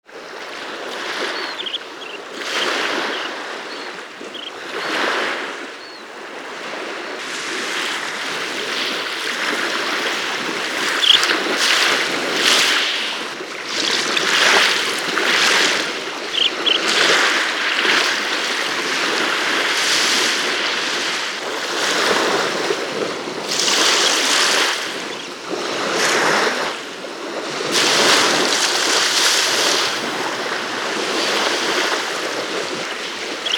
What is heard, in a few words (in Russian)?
krasnozobik golos